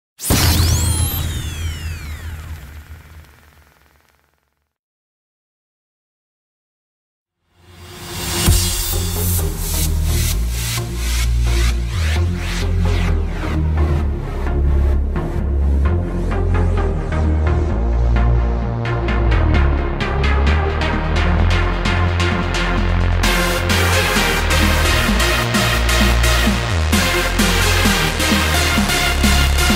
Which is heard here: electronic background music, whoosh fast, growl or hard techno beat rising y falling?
hard techno beat rising y falling